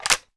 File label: ak47 mag out; weapons